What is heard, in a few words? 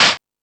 Dance, Drum Machines